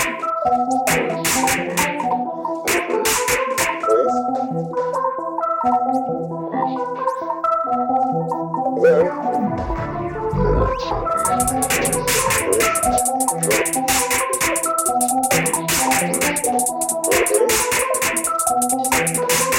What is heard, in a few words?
Arp